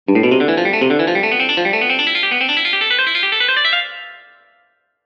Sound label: hammering